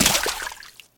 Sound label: water land; Golden Crayon Sounds